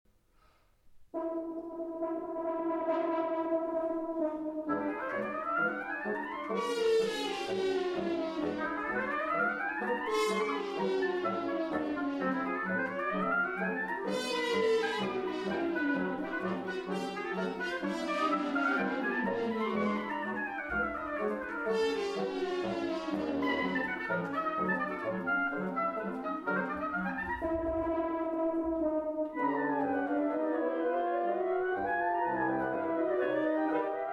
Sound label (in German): Quintett Nr 1 Allegro; Jean Francaix